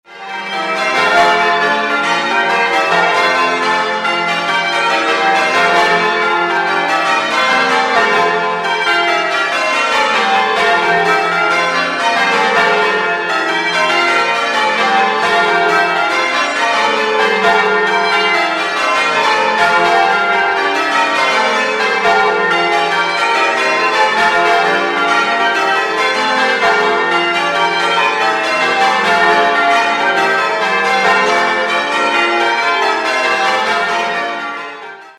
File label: 10 bells 16cwt in F; St Michael Southampton